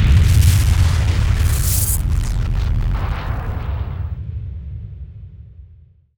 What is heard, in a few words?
explosion shockwave; Allegiance